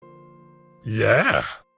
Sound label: yeah